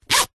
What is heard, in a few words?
foley clothing jacket cloth zipper fast; zapsplat